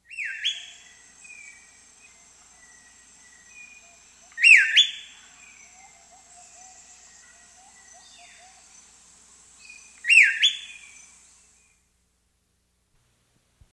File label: Rufous Piha, S. America